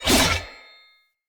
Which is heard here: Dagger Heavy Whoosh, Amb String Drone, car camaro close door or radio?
Dagger Heavy Whoosh